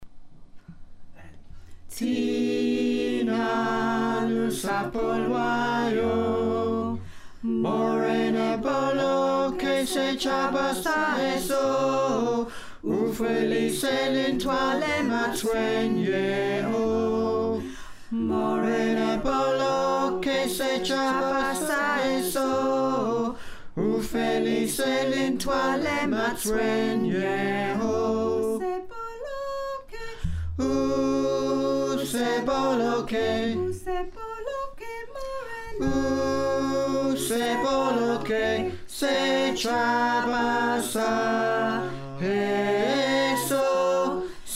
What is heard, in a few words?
Tenor, choir